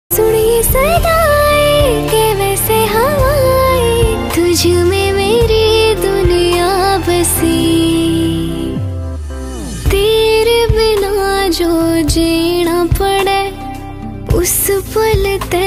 haryanvi ringtone

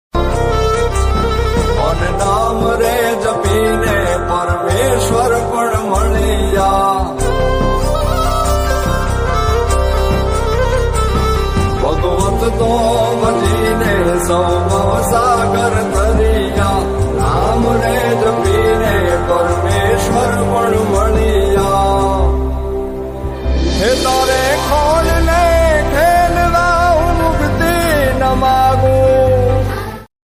gujarati ringtone

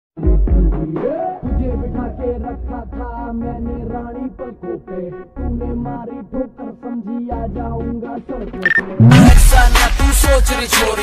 Slowed reverb ringtone

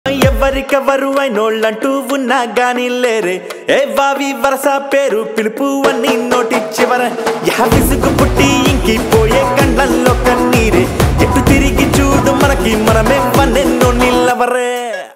telugu ringtone

